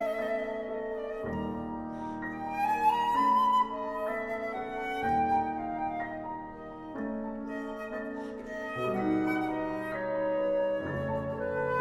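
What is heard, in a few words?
scènes de la forêt op 123 for flute horn and piano nocturne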